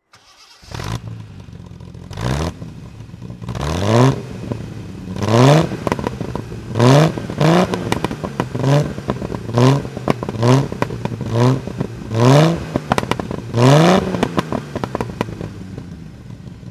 triumph tr6 pi throttle; Triumph TR 6 PI, 1969; Engine sound; V3D/ ClassicarImages

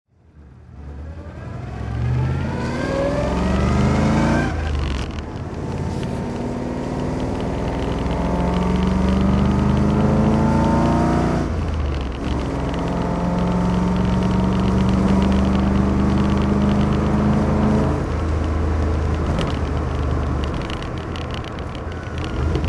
daimler sp250 driving

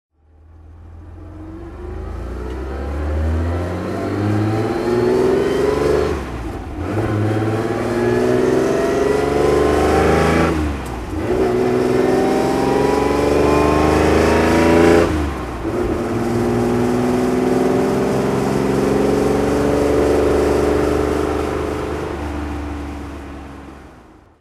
healey 3000 MkI race driving

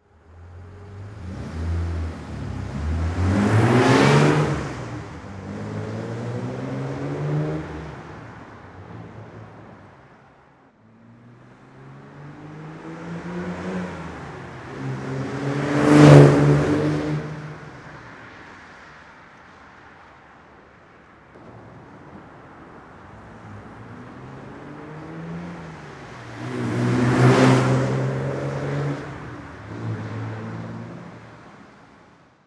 austin healey 100 6 rally drive by

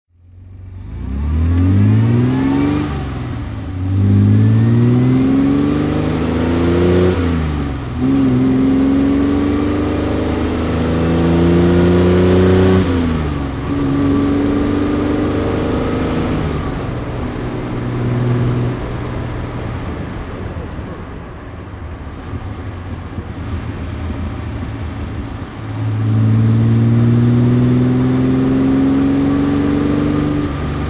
austin healey 100 6 rally drive

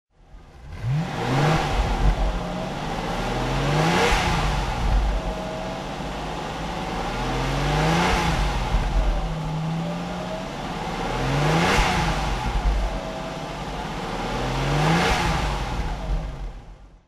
jaguar xjs 53L v12 conv throttle

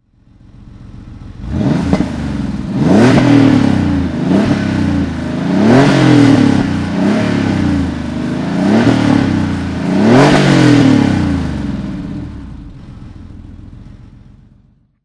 porsche 911 964 C4 coupe throttle

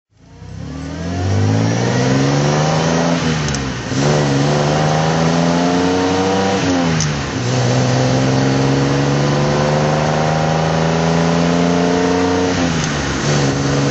healey 3000mk3 ph2 drive

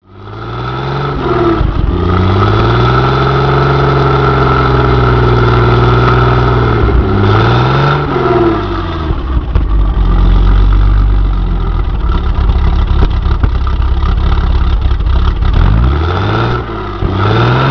bentley turner; bentley turnerc

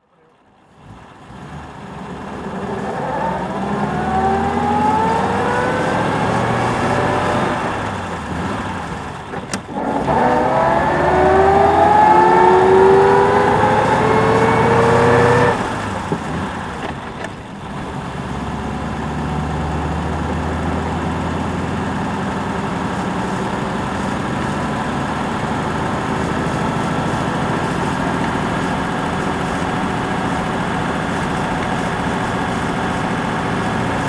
packard 640 driving, Engine sound driving; Packard 640 Custom 8 saloon, 1928; V3D/ ClassicarImages; Marc Vorgers

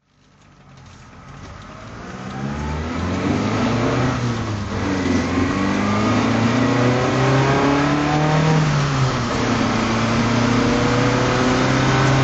alfa romeo giulia 1600 sprint gt drive

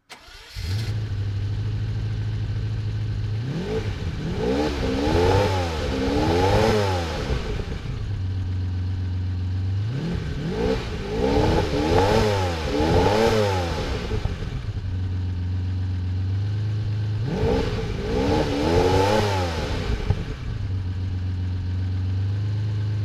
bugatti eb110; Bugatti EB110 GT, 1994; Engine sound; V3D/ ClassicarImages

